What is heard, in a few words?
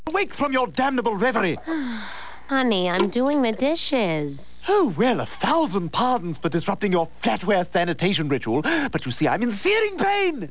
seering pain; Family Guy